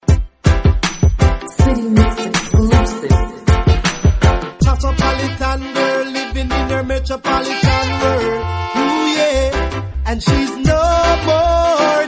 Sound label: reggae